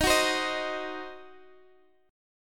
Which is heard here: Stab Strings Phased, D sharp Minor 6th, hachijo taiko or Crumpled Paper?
D sharp Minor 6th